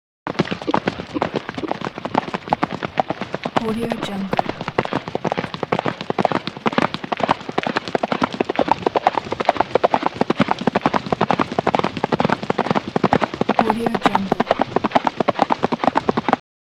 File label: two horses constantly gallop on hard earth; hollywoodedge